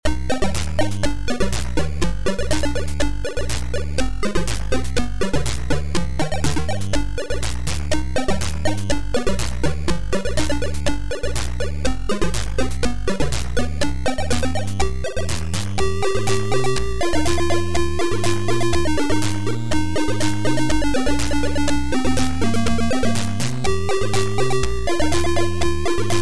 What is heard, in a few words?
GUITAR